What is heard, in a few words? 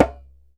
DJEMBE, African Perc